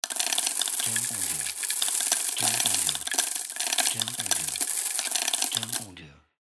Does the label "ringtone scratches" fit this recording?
No